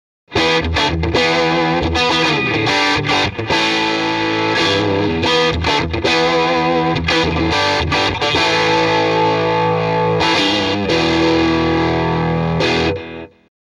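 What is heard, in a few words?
m75 tele bridge pickup jtm45 straight in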